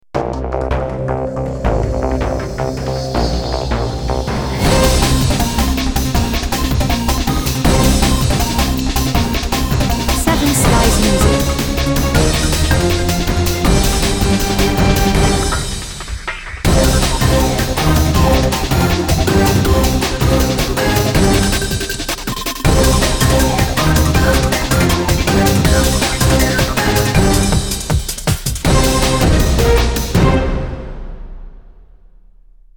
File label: Technical Jungle